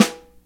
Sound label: snare drum e key